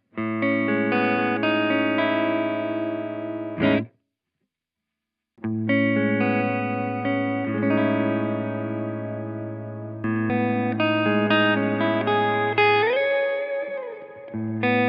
Warm Chamber

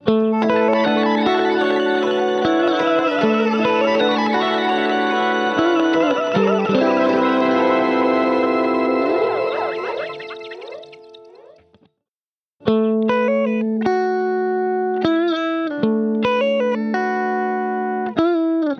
Guitar; Mosaic; H90

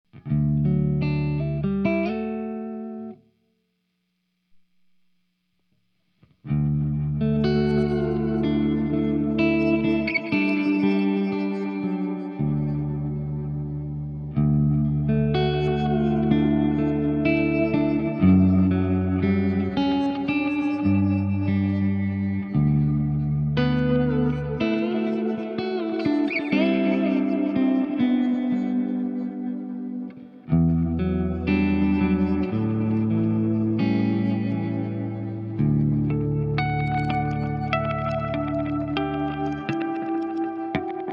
TimeLine MX Oily Octaves, strymon timeline mx

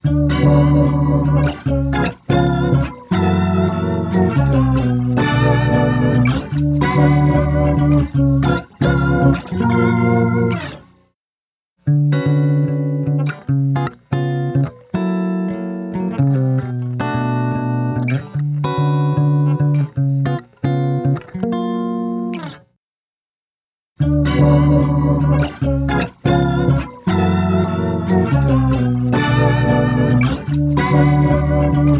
Guitar; Jazzy BZ; H90

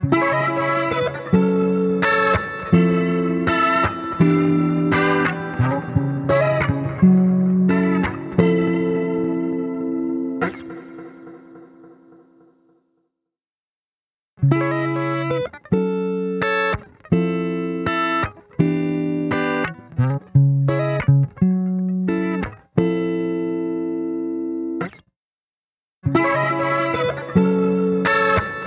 Guitar; Space Spiral; H90

